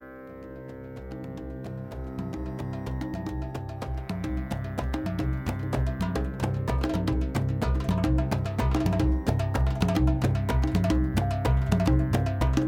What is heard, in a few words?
Exotic Tavern, soundtrack; dungeons dragonsonline; DDO